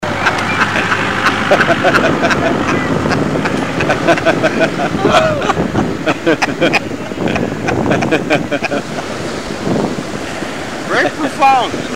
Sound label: Laugh